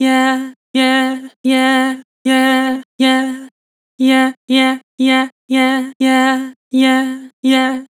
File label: Vibrato "Yeah", Vocals; Harmor Vocals